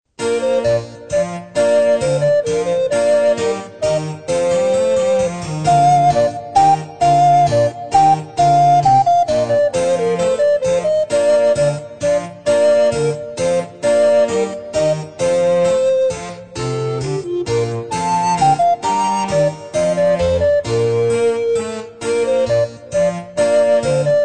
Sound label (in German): Menuett